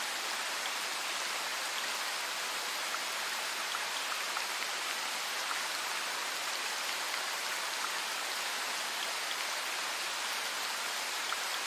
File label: Summer rain